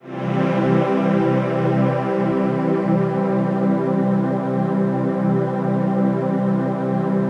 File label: CHRD PAD; Best Service; XX Large Pads; Sample CDs